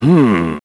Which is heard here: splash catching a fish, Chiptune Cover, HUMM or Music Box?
HUMM